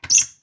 key open

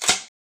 wood breaking

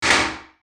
metal sheet; wood metal SFX

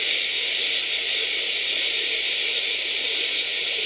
noise; cc0 sfx loops

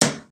wood hit; wood metal SFX

